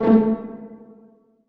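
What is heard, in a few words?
string pizzicato A2 mezzo forte left; Sound On Sound - Peter Siedleczek's Orchestra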